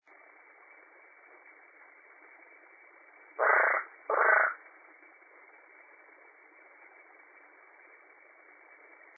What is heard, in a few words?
Smilisca cyanostica; hylidae